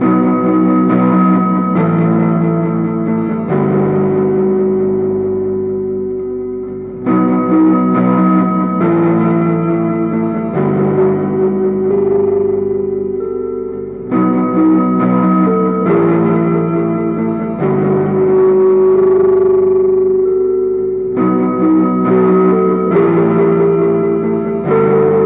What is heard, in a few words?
smashing Billy Loop